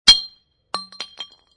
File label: Glass Bottle Drop Empty No Break, Impact, Glass Beer Bottle Drop On Cement, No Break; Glass Sound Effects